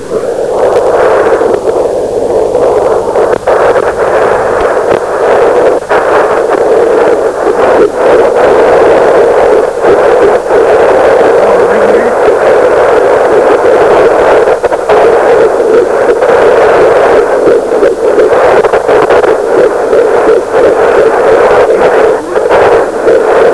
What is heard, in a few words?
HeartBeat